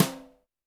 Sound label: Snare, Roland TD-20